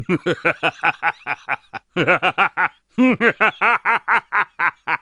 Laugh; Singed

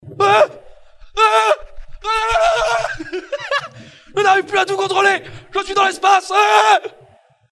blblblblblblbl

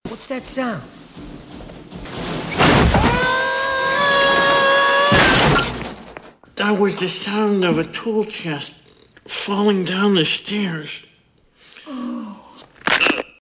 Toolchest Falling

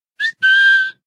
Lure Whistle

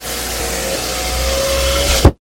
Power Window Up

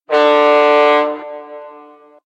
Horn; Yogscast; Sips

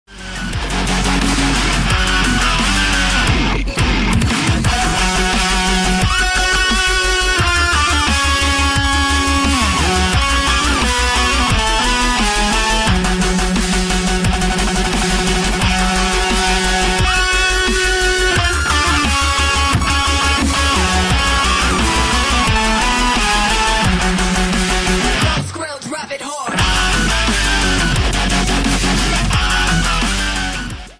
guitar cover

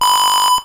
Level Timer; SMB3

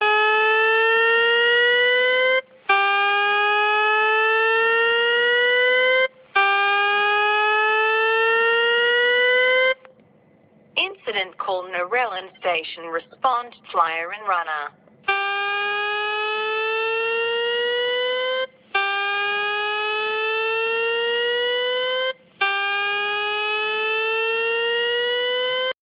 Bells

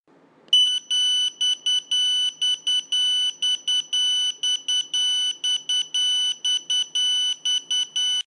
Pager; Titan 3 Flex

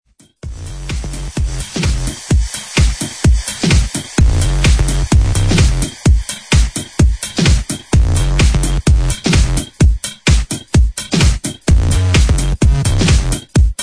electro mix

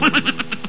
Cartoon Laugh

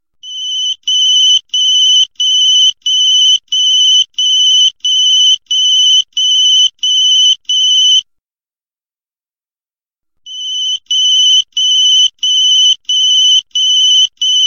Classic Clock Alarm

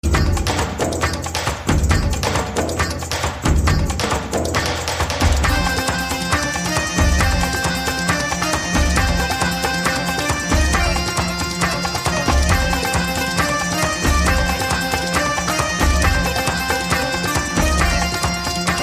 kashmiri music

